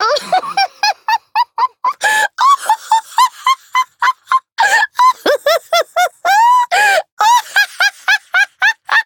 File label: laug; dqxi; serena